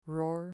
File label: roar